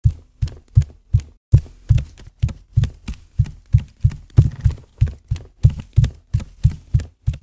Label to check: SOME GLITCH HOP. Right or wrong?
Wrong